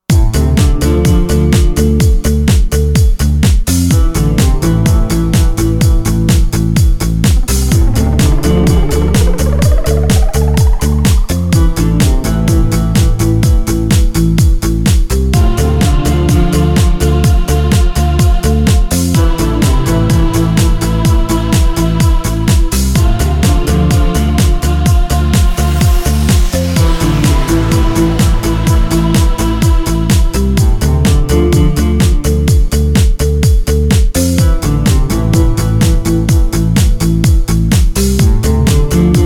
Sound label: brass minus